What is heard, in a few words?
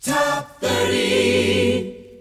jinglepackage; topformat LP jinglepackage 2